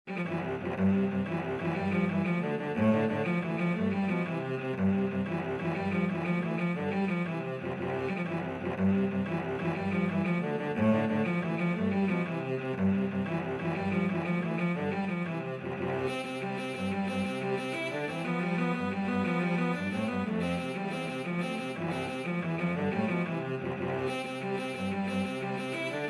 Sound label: irish jig medley cello duet